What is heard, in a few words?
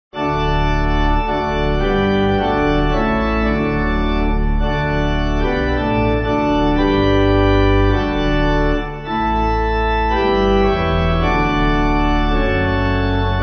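Pipe, Pipe Organ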